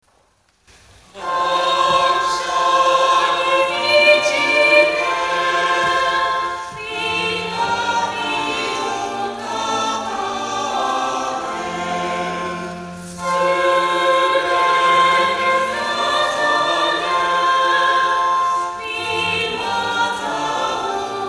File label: Choir; shalom